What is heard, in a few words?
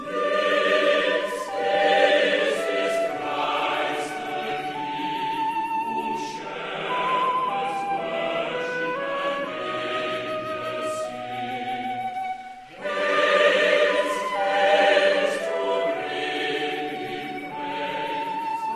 New English Ringtone